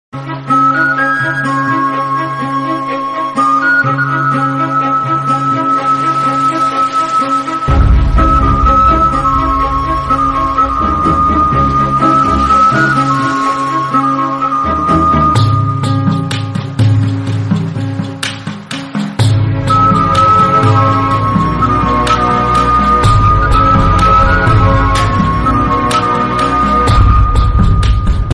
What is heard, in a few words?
bgm attitude ringtone